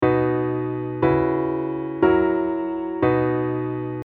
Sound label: B sus4